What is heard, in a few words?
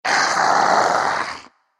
Lilienternus Roar; dinosaurs